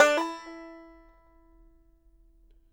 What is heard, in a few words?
BANJO NOTES, E 4; Sampleheads; Whole Lotta Country 1 & 2